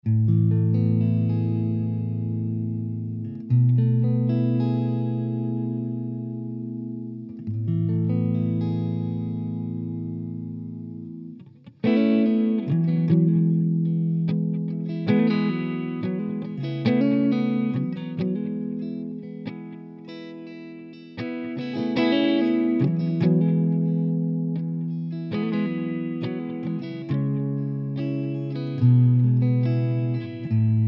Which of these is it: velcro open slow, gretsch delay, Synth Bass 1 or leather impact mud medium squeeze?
gretsch delay